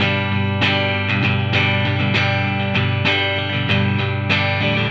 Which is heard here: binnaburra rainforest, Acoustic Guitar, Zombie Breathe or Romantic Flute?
Acoustic Guitar